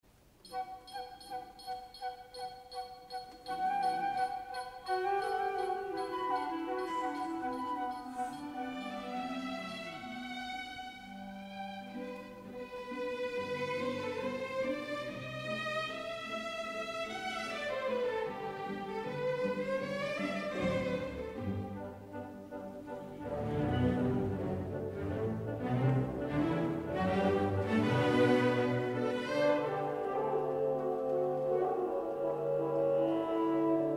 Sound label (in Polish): przy wejściu smyczków dyrygent zwalnia na chwilę, podobnie jak Mengelberg, ale po chwili puls nieznacznie przyspiesza; początek pierwszej części; Barbirolli